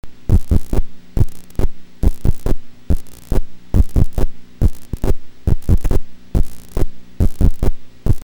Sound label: weird loop